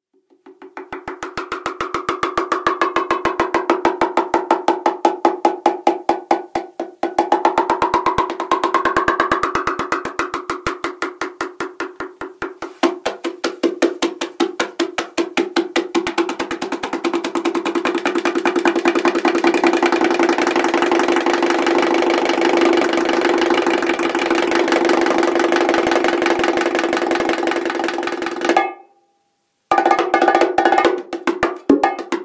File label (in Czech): Bonga sólo; MUZIKOTERAPIE